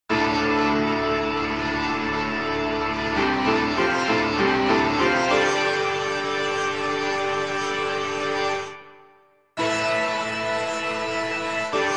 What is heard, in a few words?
overdriven fuzzy